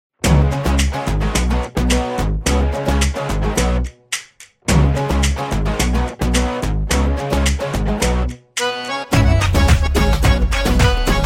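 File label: buildup background music ringtone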